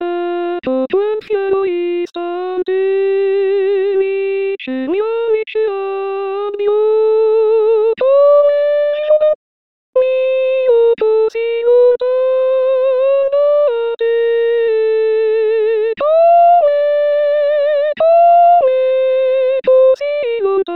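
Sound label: soprano; Mozart; Ecco Quel Fiero Istante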